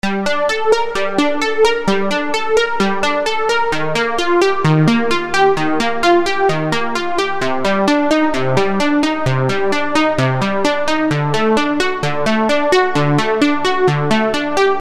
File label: D trance